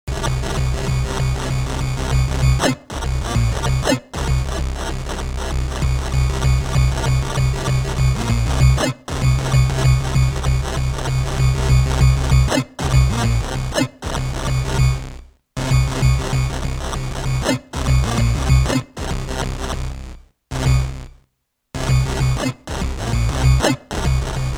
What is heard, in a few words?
System 1m vs ring thing